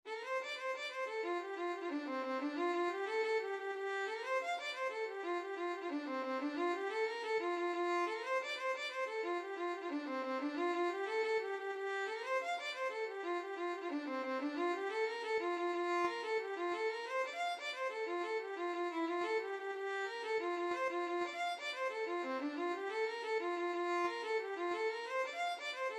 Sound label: violin